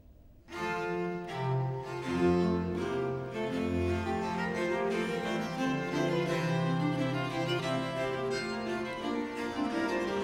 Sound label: consort sett a 6 in f major aire, viols